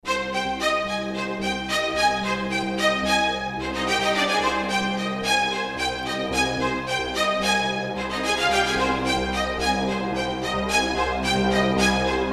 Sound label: Epic Orchestral music; funny halloween